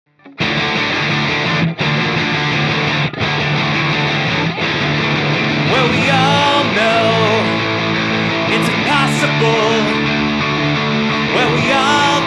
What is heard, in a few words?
171bpm track